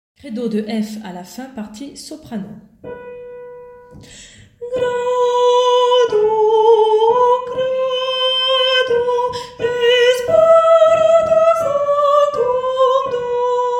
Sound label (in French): partie soprano; Credo f à la fin